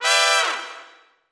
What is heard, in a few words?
Music Effect; Various Trumpet Jazz Hits.